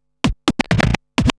Scratch Fills 140BPM

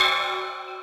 kit, Yamaha Motif-ES8

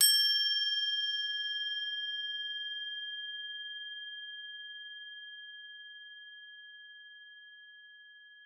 CRO G#4, CROTALES; Xsample VOL-4

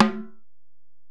Ac Perc, Drum Machines Alesis DM-PRO

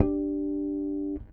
Harmonic One Shot DG, Bass; Bass X

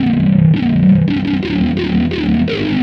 Bass, Futurist Drum & Bass

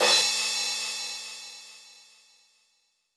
Crash A2, Roland U220

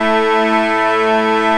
BAGPIPE D1; World Combo

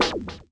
vinyl snare; Trance Explosion Vol1

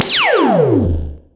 Zapps, sci-fi

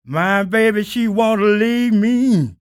SING, Blues Soul; Spectrasonics Vocal Planet

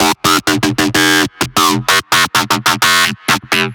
128 Fm, Melodic Loops; M8; Electronisounds

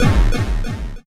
DROID; Best Service Dream Experience; SF+EFFECTS

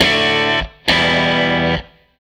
Strat Guitar Loop

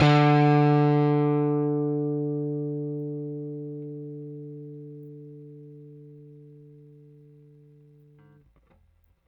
Rickenbacker Bass; Airwindows

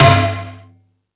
Multi FX, Drum Machines, E-MU Pro-Cussion

